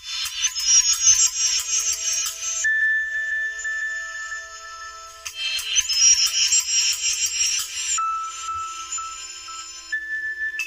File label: Nokia Ringtone 8.1 X7; Nokia Official